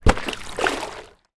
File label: sport body board down in water; zapsplat